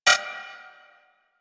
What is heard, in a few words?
Energy Ball Charge Critical; Mine Osprey